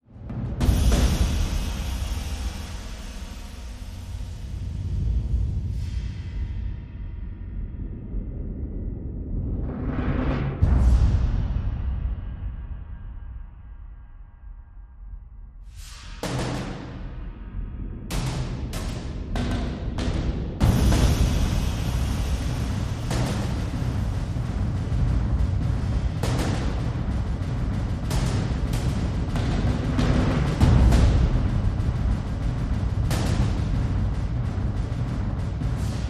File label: Epic War Drums